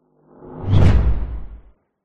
sound design whoosh impact hard wind; zapsplat

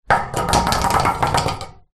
small branch stick scrape on metal garage door; foley

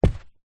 footstep barefoot single on worn carpet

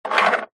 money coin slide push wood table; foley

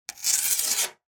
Just Metal Scrapes and Scratches; soundbits

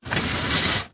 household clothes airer metal drag concrete short; zapsplat

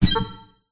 science fiction computer error beep poof; zapsplat

